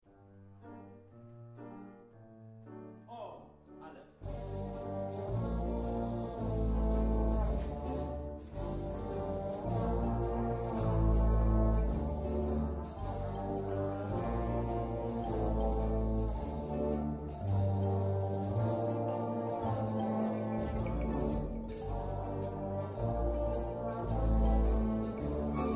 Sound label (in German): ganzer Durchlauf; Probenmitschnitte